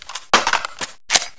spf reload; portal house

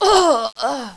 shot, female

